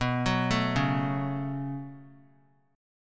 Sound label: B 7th Suspended 2nd; B7sus2; arpeggiated; JGuitar